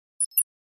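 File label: Flash Beep Electronic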